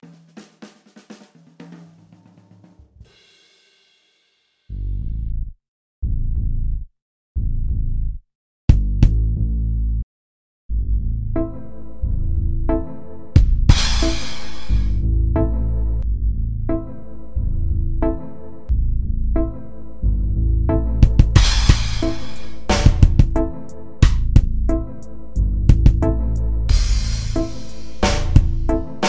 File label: Bassline